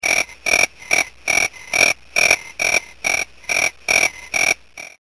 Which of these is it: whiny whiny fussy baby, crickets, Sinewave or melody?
crickets